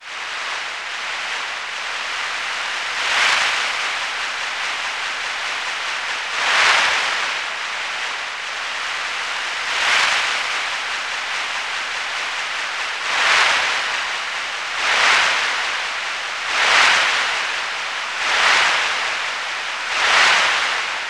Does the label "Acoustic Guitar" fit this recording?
No